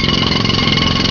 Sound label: jackhammer sound